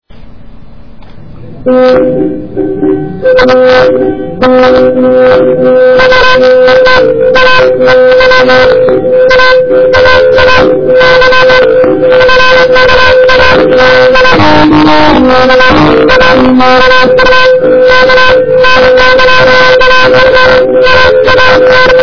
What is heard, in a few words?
akonting; Etnologia